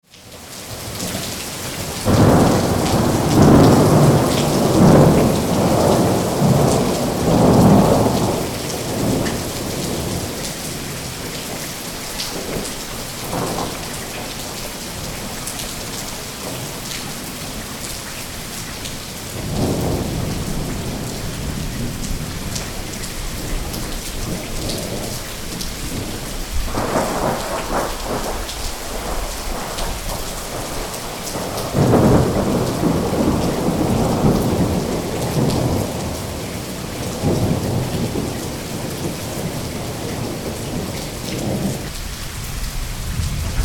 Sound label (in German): Regen mit Gewitter